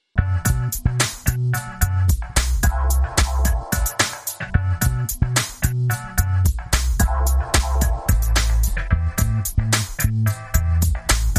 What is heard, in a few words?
Bass Flow